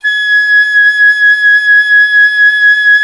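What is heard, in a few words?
flute piccolo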